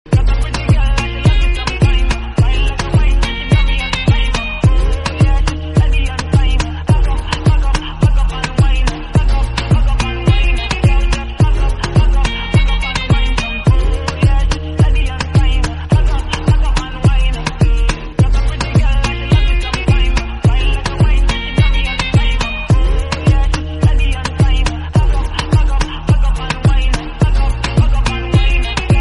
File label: fast dance music instrumental; english ringtones